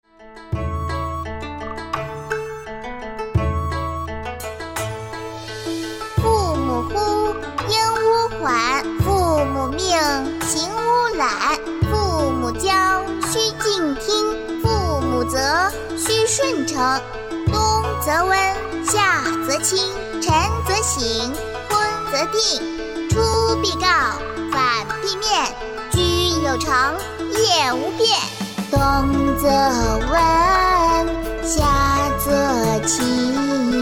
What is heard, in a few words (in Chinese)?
女 童声说唱弟子规